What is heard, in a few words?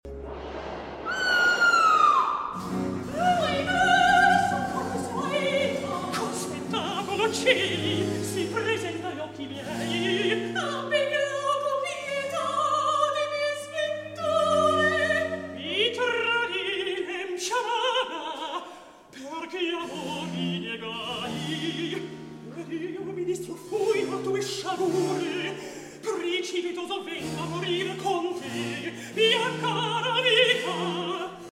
Recitativo; Ahi-Mè! Soccorso! Aita!; Polifemo